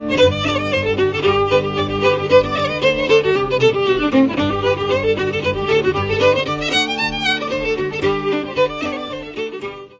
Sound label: fiddle